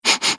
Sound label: Sniff Sniff; dio